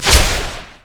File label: basic attack; Viktor